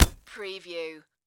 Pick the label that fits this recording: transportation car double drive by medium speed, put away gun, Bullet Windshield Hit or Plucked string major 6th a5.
Bullet Windshield Hit